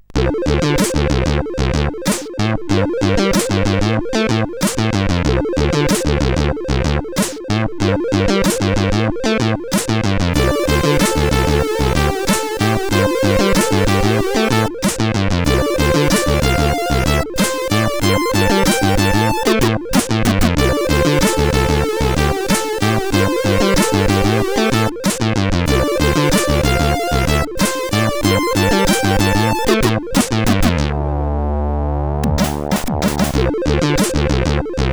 sid, CSG8580R5

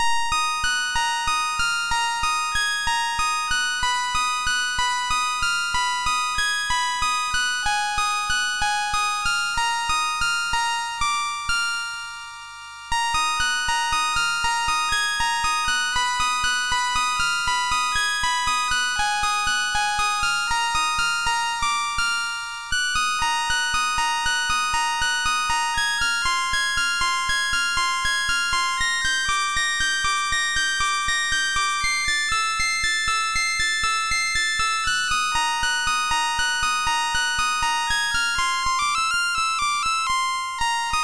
Samba, MOS6581R2

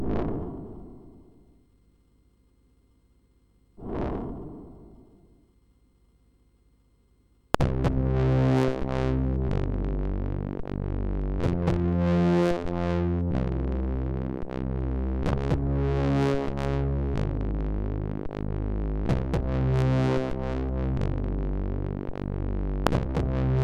MOS6581; sid

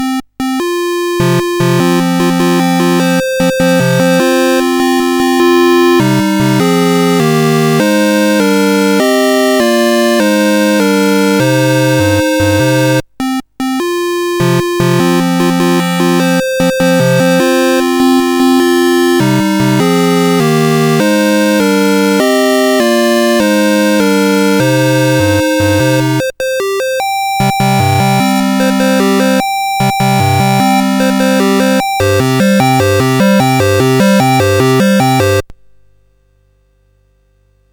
Dragonworld sid CSG8580R5

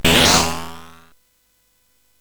Jump Beam, CSG8580

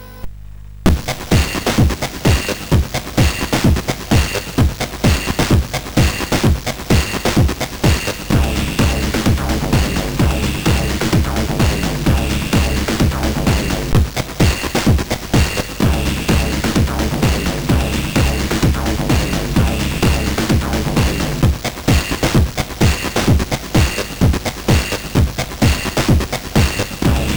sid, CSG8580R5

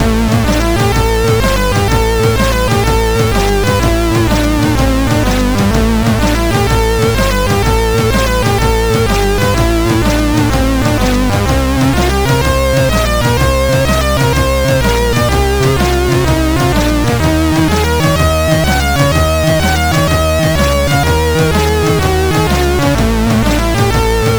Loader sid CSG8580R5; Systeam Demo

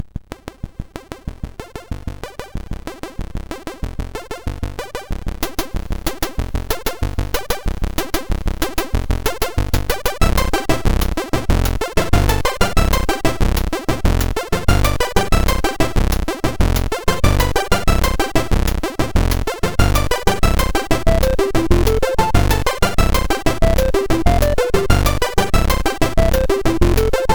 MOS6581R2

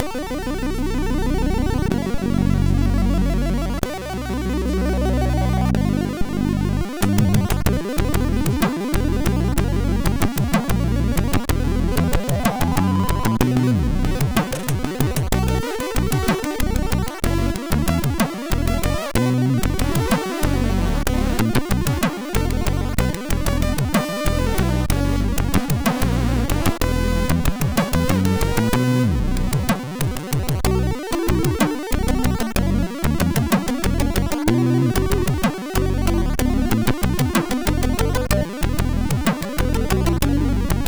sid, MOS6581; MOS6581R4

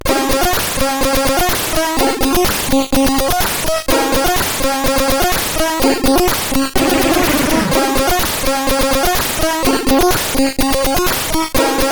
Game Music, sid CSG8580R5

